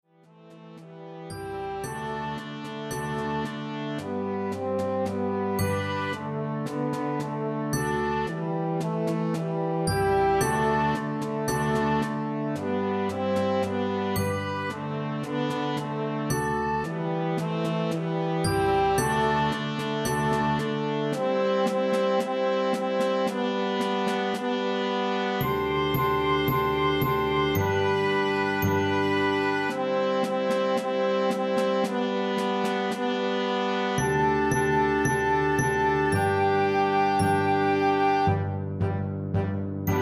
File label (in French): ritournelle vents court, Pour Ensemble à Vents et Section rythmique